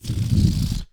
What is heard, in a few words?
Balloon, cartoon Foley Fx; musicradar; cartoon caper